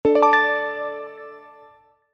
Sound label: SUCCESS